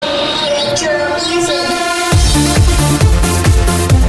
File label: Electro Music